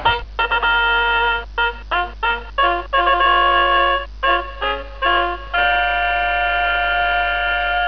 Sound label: fanfare